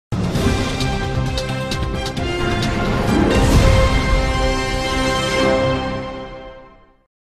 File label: Jingle News avec Incrustation